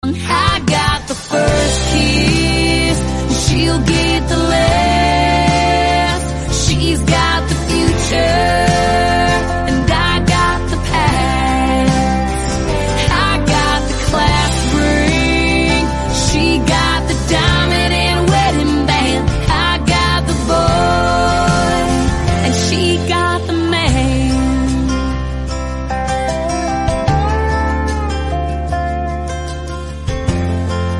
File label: English Music